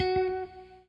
ROCK, Guitar

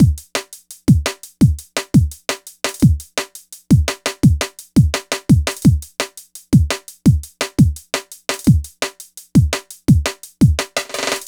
DRM; Best Service ProSamples vol.03 - Drum & Bass 1; AKAI

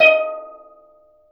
D#4 TENOR, STEEL DRUMS; AKAI S6000 CD-ROM; WorldSounds

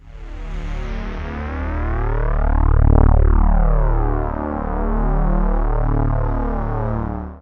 BIG SWP, Trance, SYNTH; AMG; Global Trance Mission VOL-2

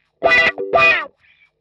125 wah E, wahwah; PowerFX - Nu Jazz House

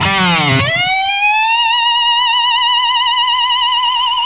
Feedback, Industrial; Nasty

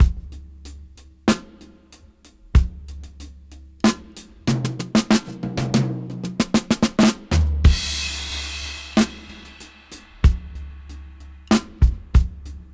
Half Time Slow 94 BPM; AMG - Keith LeBlanc Phat Butt Jams

